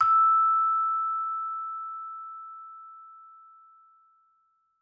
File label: Vibes soft E5, Vibraphone, Soft Mallets; Struck Idiophones